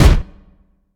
pistol fire

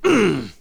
pain

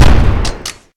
shotgun fire

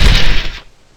bump

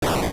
bag hit

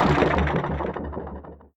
boost end

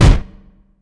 pistol fire

